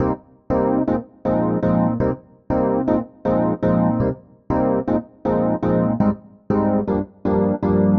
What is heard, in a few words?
Chord El Piano Cm, 120bpm; Zero-G; Extra Chord Progs